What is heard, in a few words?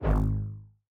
throw ball wave; CrossCode; battle